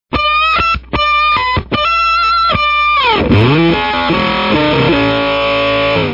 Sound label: ring